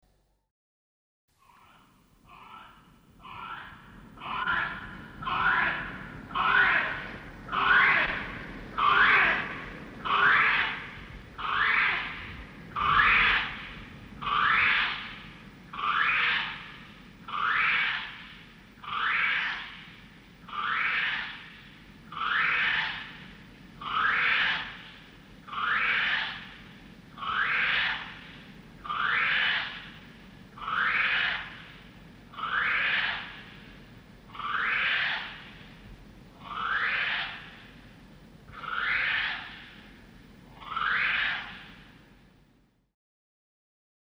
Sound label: Kiwi female, Bird Calls